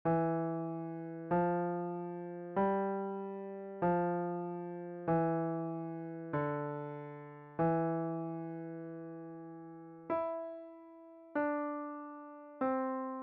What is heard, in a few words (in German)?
Start Up, Klavier